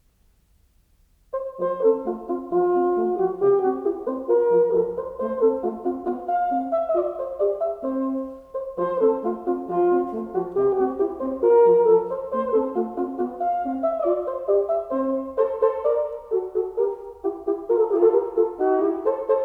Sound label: Duos For 2 Horns, Allegro; Wolfgang Amadeus Mozart; Divertimenti; Serenades and Divertimenti for Wind; 12 Duos For 2 Horns, K.487